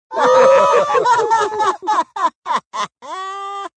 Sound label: Laugh Group Fun, Group laugh, comical, small close group, (human voice); Laughter